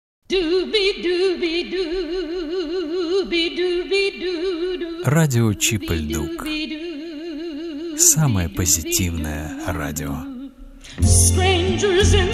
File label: jingle radio